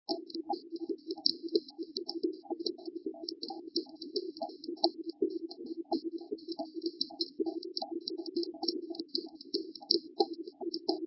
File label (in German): Sumpfhorngrille